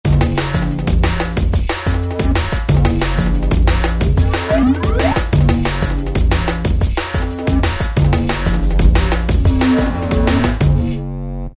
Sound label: Dark & Cyber, Korg ES-1 Factory Patterns